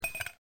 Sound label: ICE